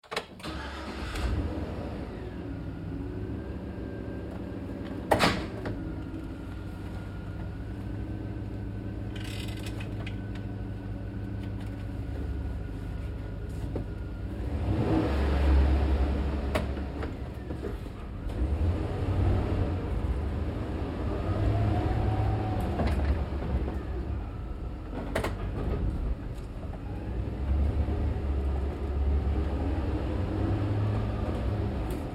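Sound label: Truck GMC Savana interior 3 Point Turns Maneuvers Slow; Trucks; Big Room Sound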